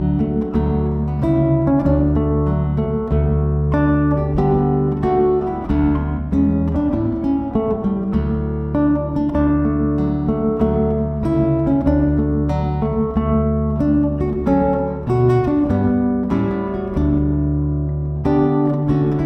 Bridal Chorus traditional, classical guitar

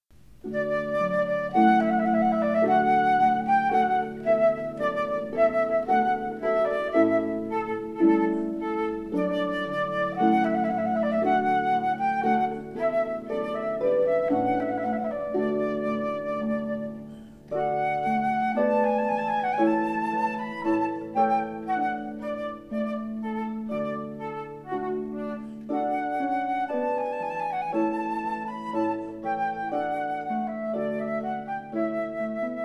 Trumpet Voluntary with harp, Flute

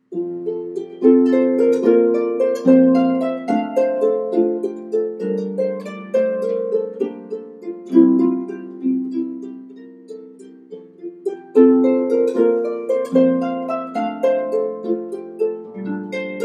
harp

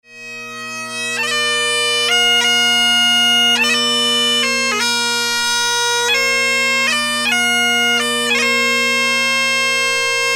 bagpipes

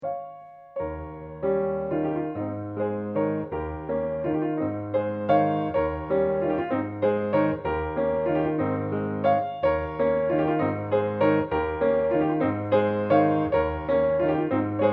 piano; Chopin Waltz Op.69 No.1

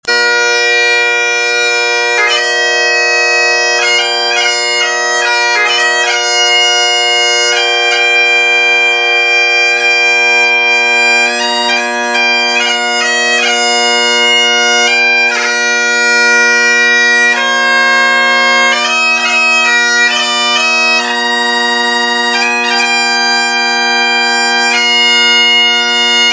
Bagpipes

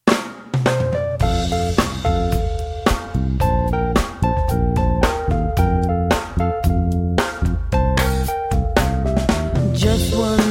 Motown Classic, jazz band

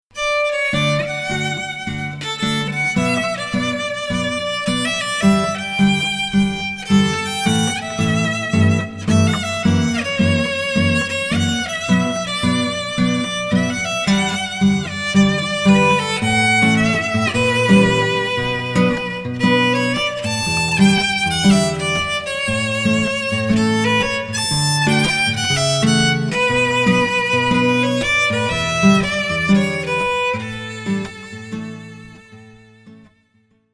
violin

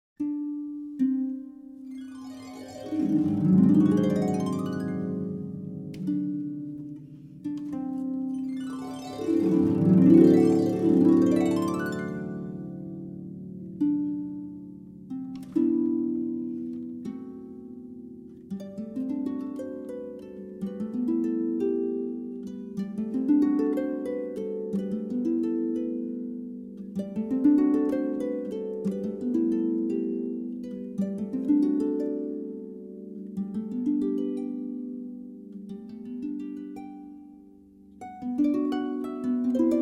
Barcarolle, Harp

